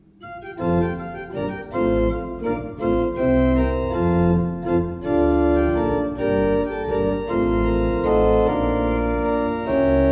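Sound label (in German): Bosch Raupach 5 I P, pfeifenorgeln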